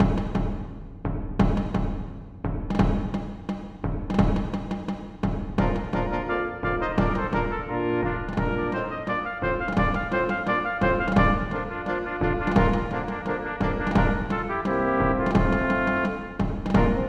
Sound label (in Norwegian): March for messingkvintett (ad lib trommer); Aamodt